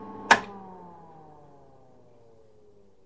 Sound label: pc shutdown